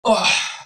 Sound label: human; creature